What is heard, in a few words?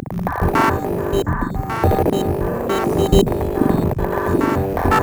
105bpm; elektron machinedrum